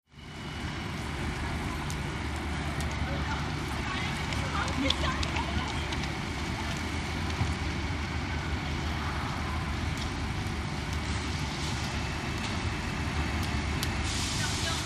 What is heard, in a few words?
Train Station Gen Atm